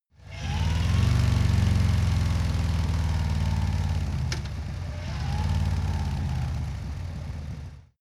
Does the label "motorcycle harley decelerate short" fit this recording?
Yes